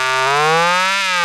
syn c, Dance Synths, Wasps; Best Service ProSamples vol.37 - Dance Synths

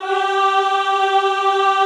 EHH G3, Classical Choir; Best Service

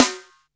Snare, Boss DR-660, Drum Machines; 90 s Sample CDs; 300 Drum Machines

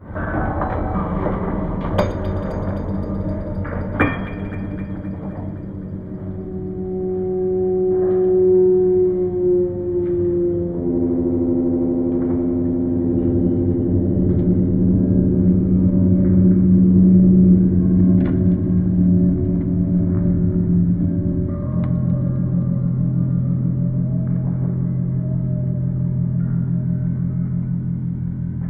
Phantom Workshop F3; Zero-G - Ghost In The Machine

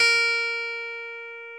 clavinet A#4; InVision Interactive; Lightware VOL-1; Instruments & Percussions

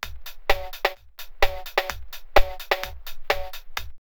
drumloops Rave 128; X-Static Goldmine; 90 s Sample CDs

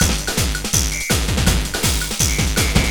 LOOP, Eurotech; Sounds Good

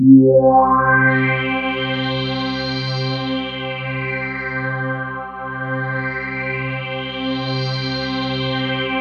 new world, old synths; Rubber Chicken Software Sample Bank

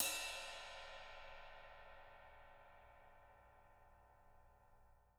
rides

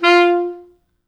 F3, Pop Brass; Best Service ProSamples vol.19; AKAI

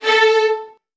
A2, VN 16TH, Virtuoso Strings; ILIO; Kirk Hunter

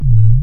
Stabs, Elektron MachineDrum; SPS1 MKII

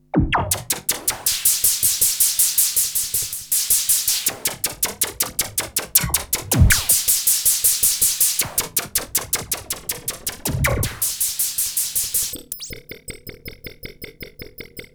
Korg Prophecy hits, arps and fx

